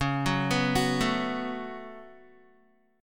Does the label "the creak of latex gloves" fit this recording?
No